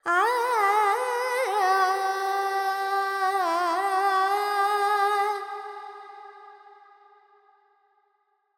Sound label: Carnatic Female Alaps, Panthuvarali, 100 G, FX; Sample Banks